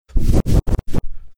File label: air blasting into microphone